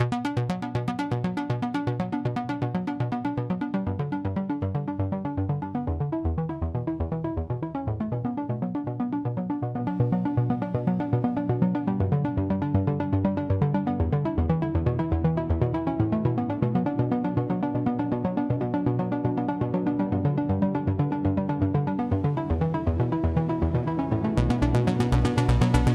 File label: Electronic Impulse